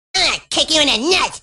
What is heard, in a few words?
kick; Hacked Hot Wired and Channeling Cartman